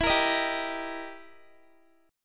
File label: E Minor Major 7th Flat 5th, E, down, Guitar; EmM7b5; strummed; JGuitar